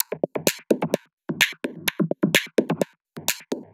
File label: Houseloops 128BPM; Vengeance Samples